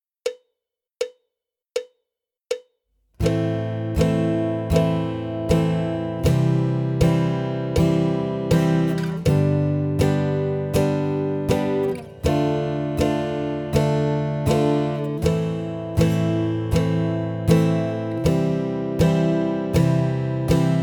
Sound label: Open Chords Bar Chord, guitar